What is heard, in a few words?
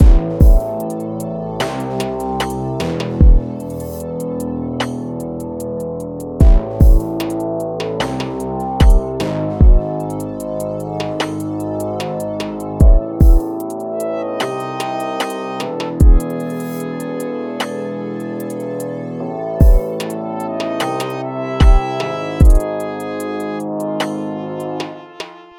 loop